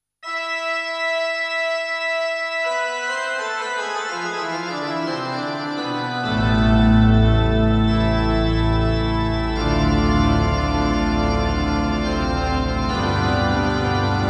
Music; Theron's Quest; PC Engine

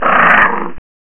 Amiga Attack Giant Scorpion Sound; Dungeon Master and Chaos Strikes Back

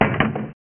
Move (Animated Armour); Dungeon Master and Chaos Strikes Back; Amiga

